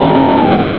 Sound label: whiscash, cries; pokeemerald